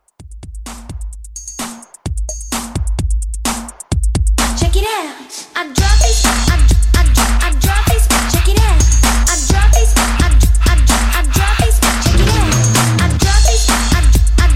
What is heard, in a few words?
Old School Mashup Re Drum